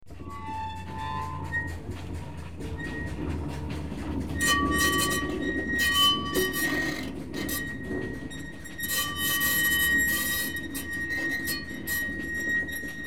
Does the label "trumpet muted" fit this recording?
No